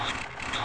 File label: Gun Elev; PC Gamer IT CD 30; panzer